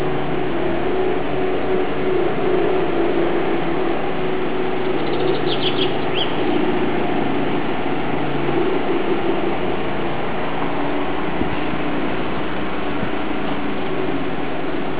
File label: balcony